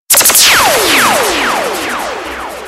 Spark Sms

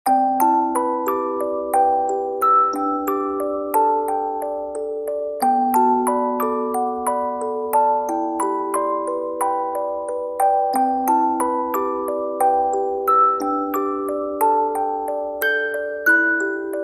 REVERSE Music Box

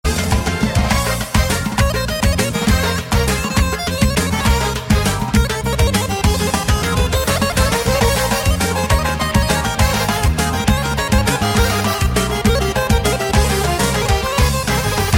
Kavkaz Armenian

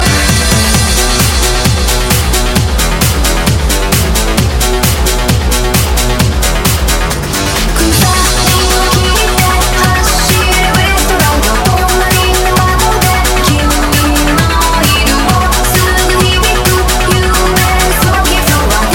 Darkwave; meloboom

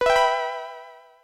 Sky Blip

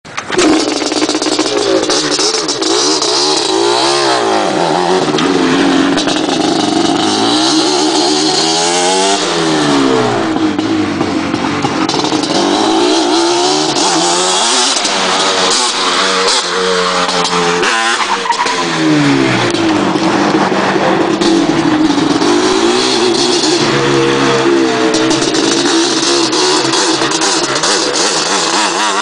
Banshee Exhaust

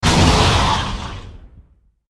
Lava Burst; Wow

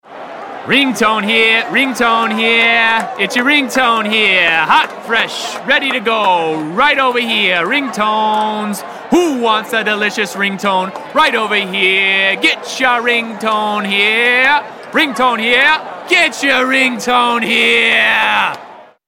Ringtone

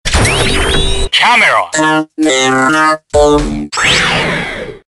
Camera Switch

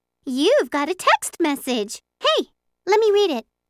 Ring Tone; Mk2 Neptun

